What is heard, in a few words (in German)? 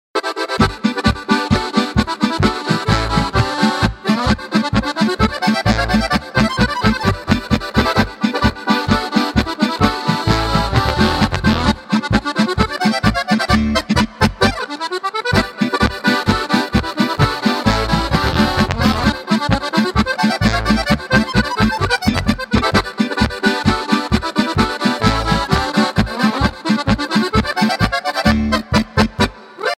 Akkordeon